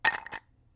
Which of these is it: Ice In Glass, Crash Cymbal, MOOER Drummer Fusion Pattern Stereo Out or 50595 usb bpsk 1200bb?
Ice In Glass